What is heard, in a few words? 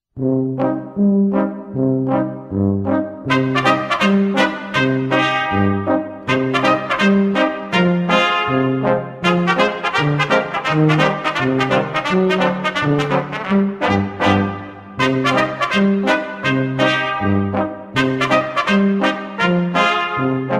Big Top Polka